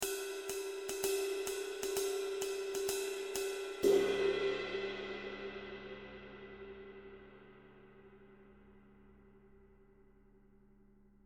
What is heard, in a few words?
zildjian